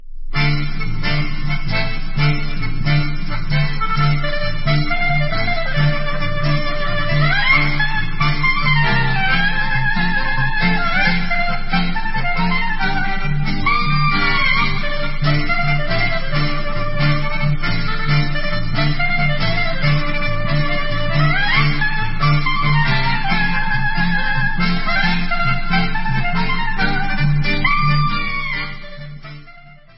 marsh, instrumental

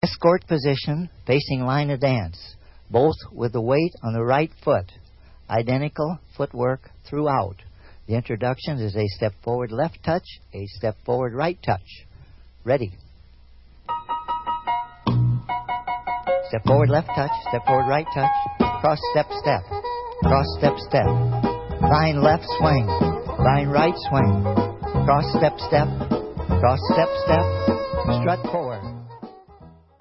Japanese Soft Shoe

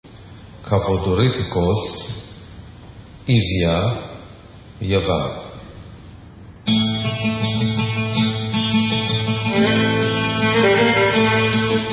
Kavodoritiko, Greek, Instrumental

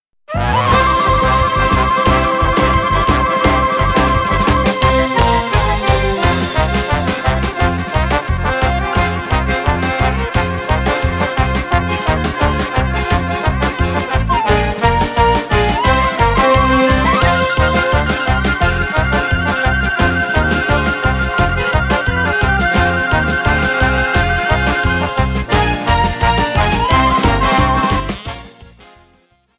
rhapsody, instrumental

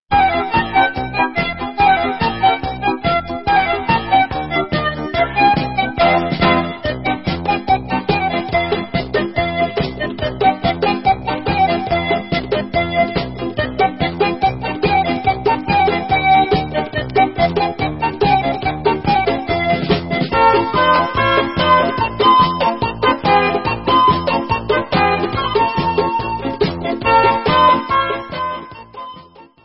Instrumental

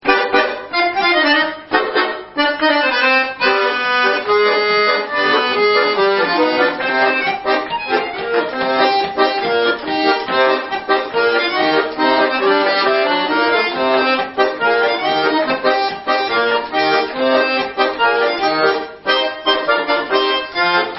Hora Instrumental

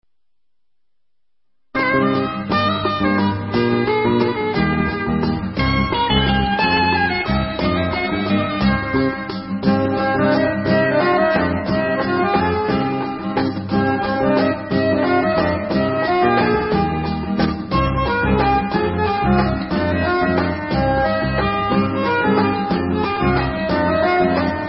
Instrumental

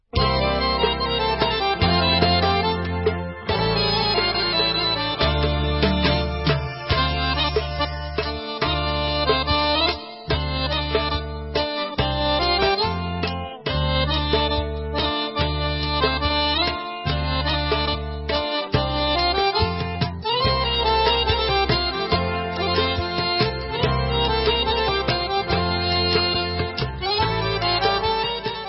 Instrumental; David Zakay

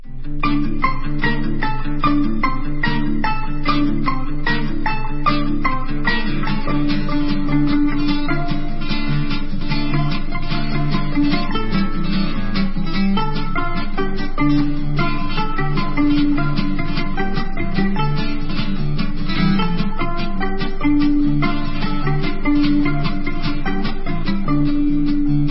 instrumental

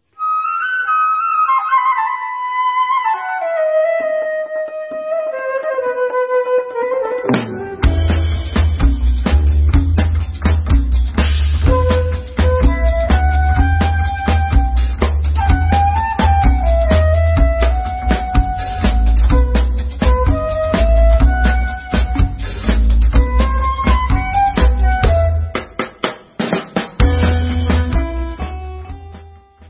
nigun, instrumental

